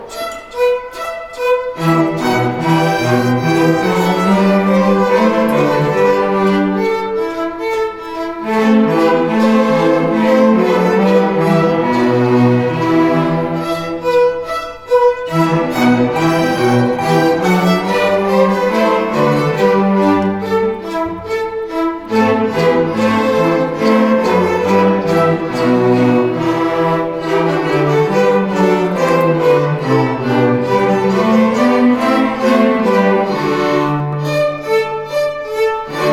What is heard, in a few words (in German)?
Händel Gavotte